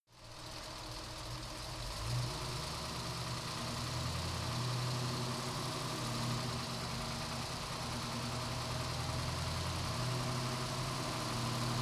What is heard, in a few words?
car camaro accelerate engine